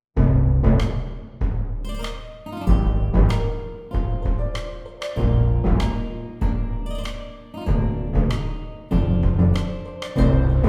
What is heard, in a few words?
Bhangra